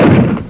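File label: THE EXPLOSION; WAR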